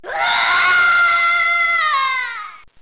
zombie regen dead; cstrike; zmod

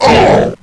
Monster Pain, zombie; cstrike

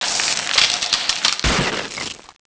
aqua swirl shot; zombie plague

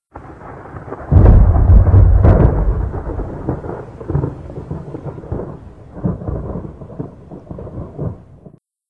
storm effect; cstrike; zombie plague

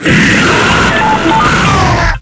FleshPound normaly; zombie plague

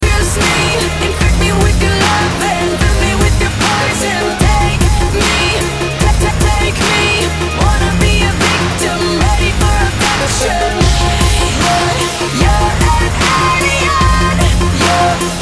zm win

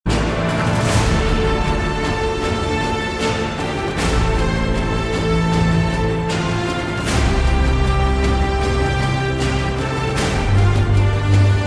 vote music; cstrike; zombie plague

